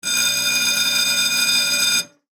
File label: Bell Ring Alarm; Blast Wave